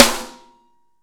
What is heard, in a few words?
SN AMBIENT, Real Mega Drums; Best Service; Real Mega Drums VOL-1